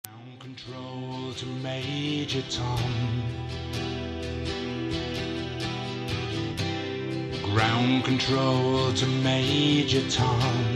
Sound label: Male Vox